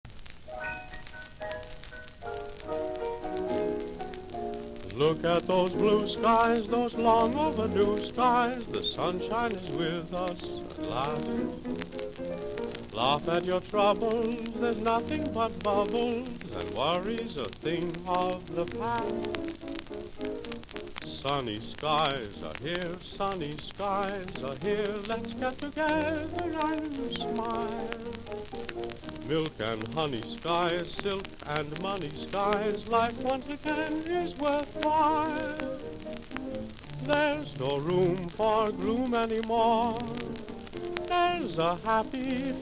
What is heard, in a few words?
vocal, Jazz; Popular Jazz Archive 1920-1936